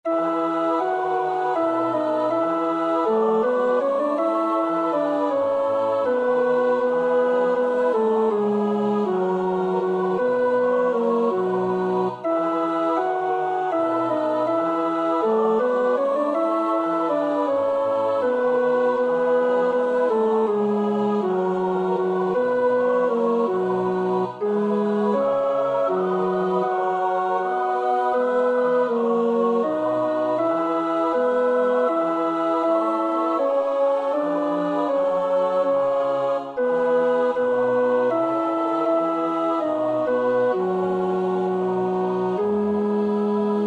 tenor; Bach (Johann Sebastiaan); BWV 086; Der Hoffnung wart’ der rechten Zeit

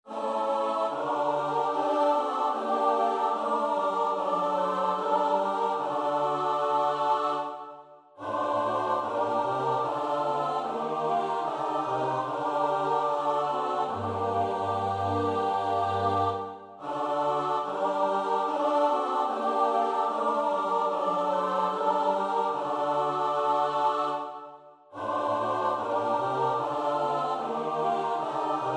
S-A-T-B; Bach (Johann Sebastiaan); BWV 077

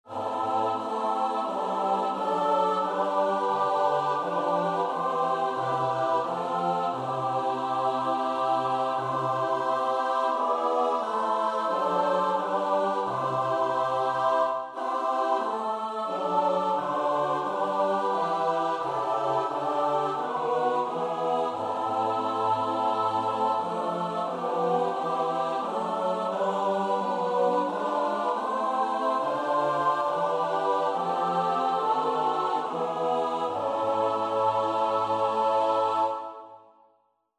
S-A-T-B; Bach (Johann Sebastiaan); 2 Die Kön’ge aus Saba kamen dar

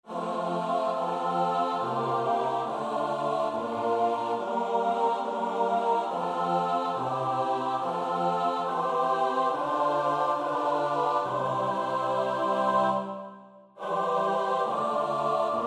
O allerliebstes Angesicht (S-A-T-B); Müller (Heinrich Fidelis); Die Passion; IV. Das Todesurteil und die Dornenkrönung

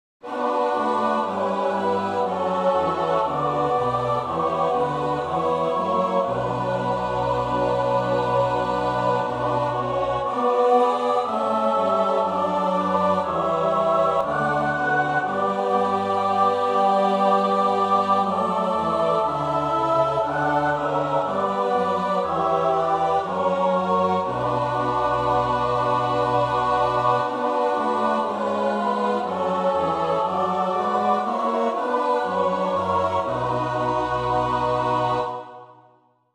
S-A-T-B; Bach (Johann Sebastiaan); BWV 036; 8.Lob sei Gott, dem Vater g'ton

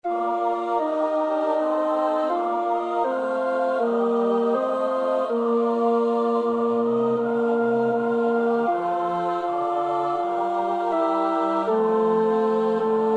tenor; Bach (Johann Sebastiaan); BWV 013; 6.So sei nun, Seele, deine

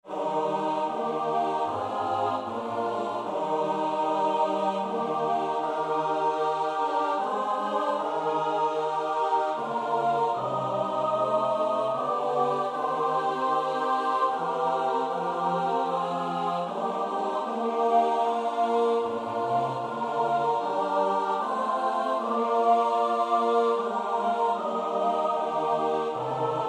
Hymn When I survey (S-A-T-B); Somervell (Arthur); The Passion of Christ

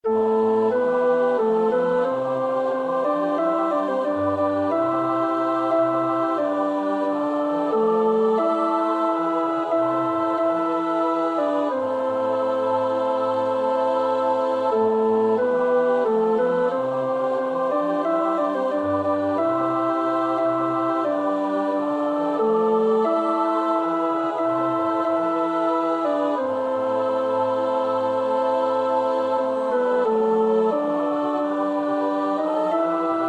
tenor; Bach (Johann Sebastiaan)

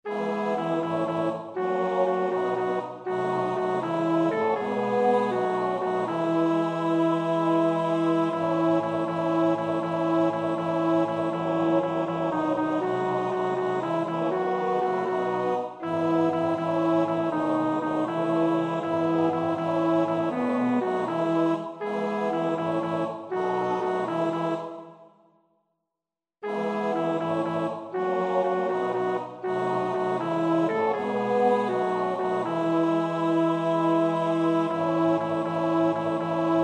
Zum Rundetanz (bas), Männerstimmen; Schubert (Franz); Vier Gesänge für vier Männerstimmen, Op. 17; 3.Zum Rundetanz, Op. 17, No. 3

